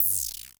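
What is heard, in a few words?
Klone Dual Percussion Synthesiser noise; KLONE FX NW8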